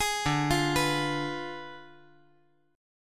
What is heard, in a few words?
D Diminished 7th D down